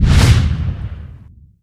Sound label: trampoline active; STALZONE; somber anomaly